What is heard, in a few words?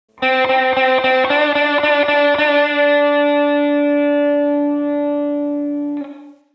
Lick